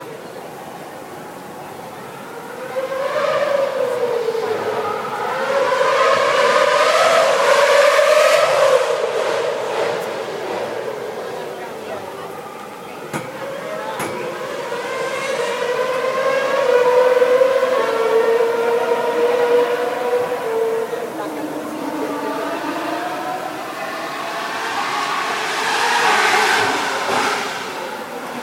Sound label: sound of singapore grand prix